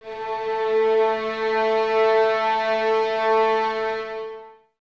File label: violins A 3, string ensembles; Miroslav Vitous